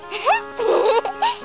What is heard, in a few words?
giggle; Nuku Nuku; Kawaii Café